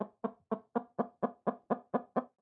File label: ambient; World of Tanks